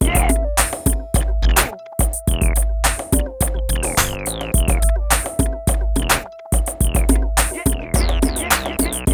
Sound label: BEAT, Beats 105-150bpm; PlatinumPhattCD1; E-MU Producer Series Vol. 8 – Platinum Phatt